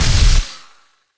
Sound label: Sci-Fi Weapons Machine Gun Single Shot; Robobeat